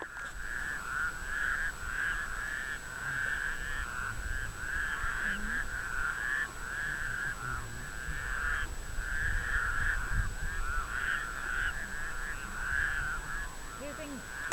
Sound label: Bufo raddei song